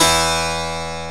HARPSICORD

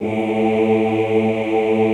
OOH A1, Classical Choir; Best Service; Extended Classical Choir

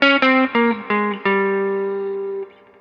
Guitar, 70s Analog Funk

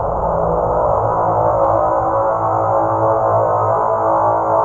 Undertone; Sonic Foundry (Sony Creative Software); Ugly Remnants VOL-2; UR 2 FX (Long)

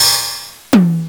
DMX, Oberheim Dmx, Drums

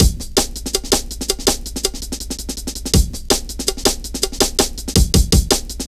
Drum Loops, Jungle

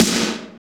SD R8, Roland R-8; DIGITAL 2

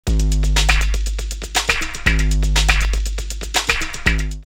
drumloops Rave 120; X-Static Goldmine; 90 s Sample CDs

